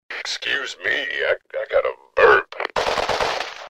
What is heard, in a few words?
burp; starcraft ii 2010